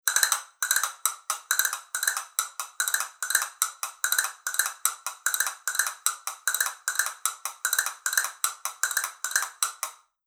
castañuelas fibra negra veteada doble caja

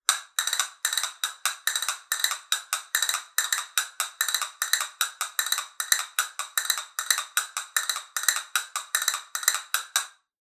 castañuelas granadillo veteado

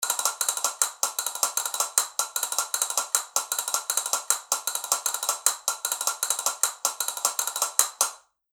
castañuelas capricho vidrio

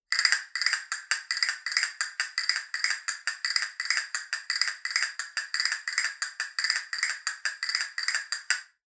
castañuelas fibra concierto

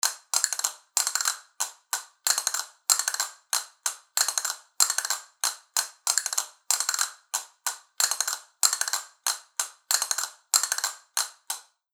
castañuelas especial jota doble caja

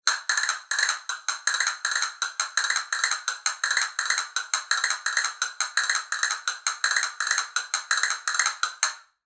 castañuelas vidrio

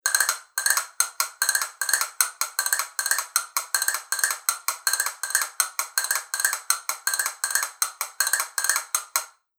castañuelas vidrio doble caja

